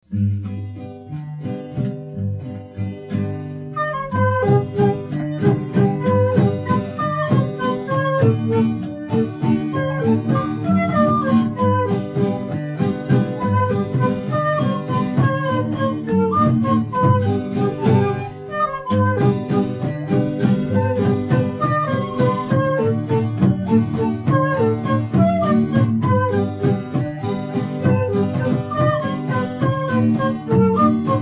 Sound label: jig